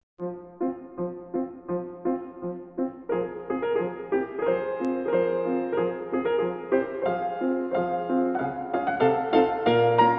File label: Musicalniy moment, fortepiano; Shubert